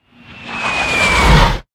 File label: Statue Falling; Angry Birds